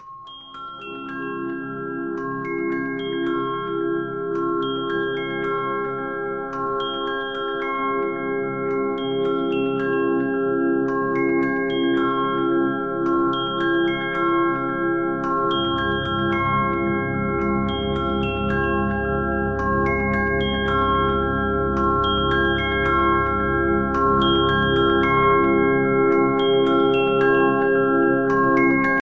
blips ringtone

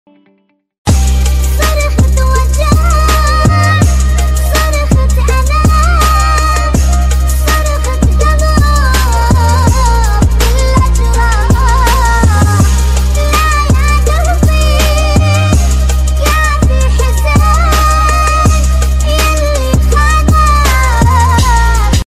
arabic song bgm ringtone

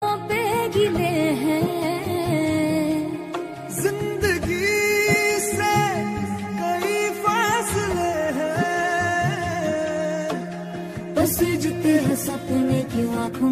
tamil sad ringtone

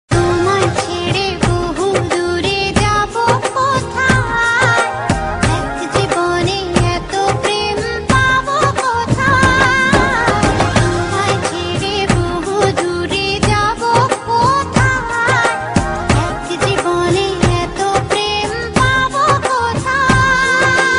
bengali sad ringtone

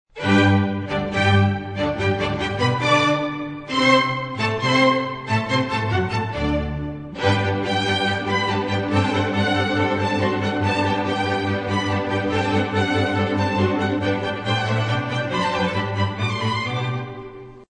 classical serenade for strings ringtone

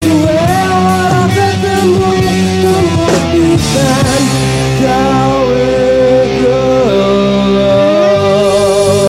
punk java ringtone; indonesian

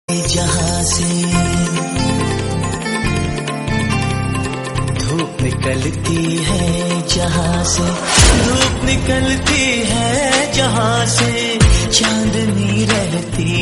srilankan ringtone

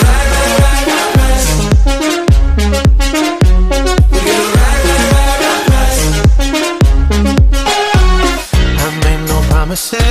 electro ringtone

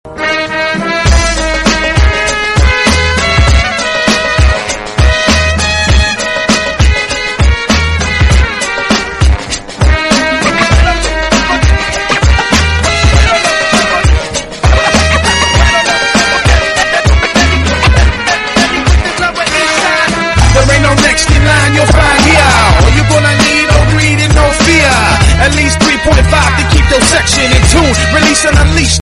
Mexican rap tone ringtone

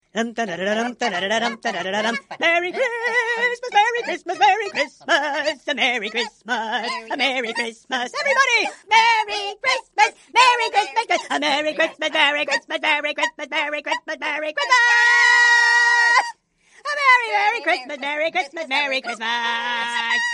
italian merry xmas tune ringtone

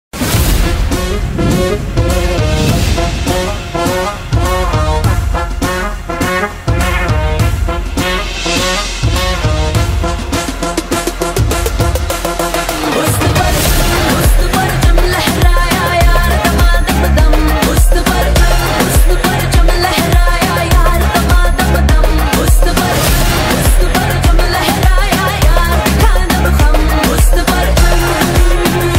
bollywood ringtone

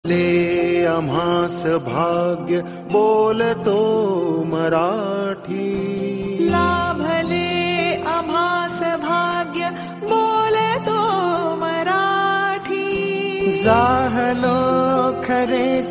marathi tune ringtone

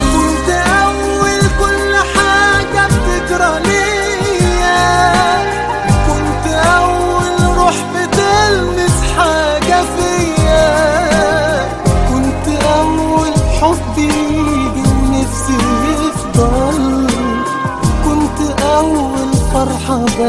arabic ringtone

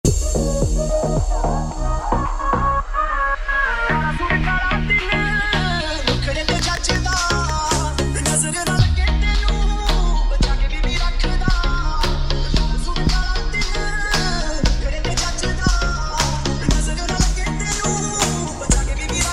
trap ringtone

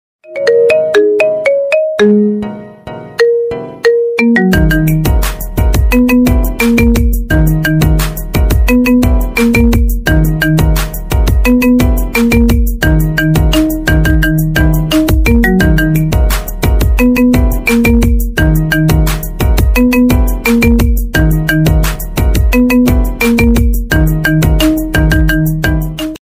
marimba iphone ringtone

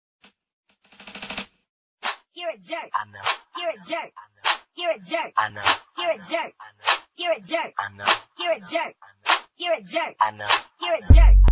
ringtone

